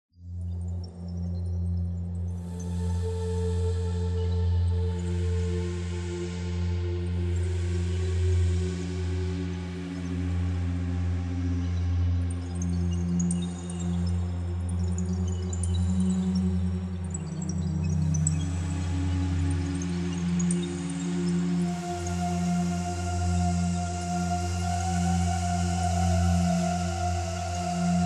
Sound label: Scary Theme; Dual Blade: Battle of the Female Ninja